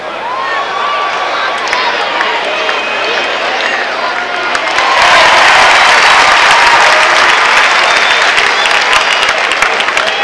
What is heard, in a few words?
applaude